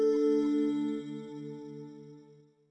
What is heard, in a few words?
slow notify